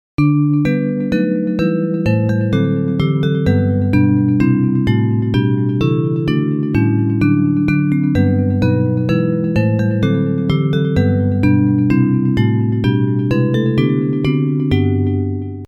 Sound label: Bells